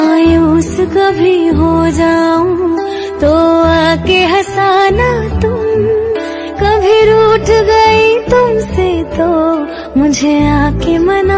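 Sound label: Female Version New Hindi Romantic Song Ringtone; ringtones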